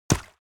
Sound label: horse step water shallow; animal; smartsoundfx